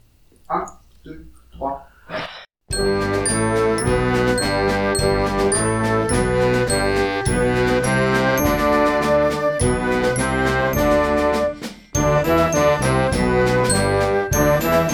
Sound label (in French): frere jacques en canon noire a 108